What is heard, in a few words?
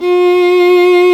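STRINGS SOLO, Chamber Grp; Viola Solo; Vla2 % marc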